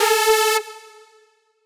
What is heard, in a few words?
synth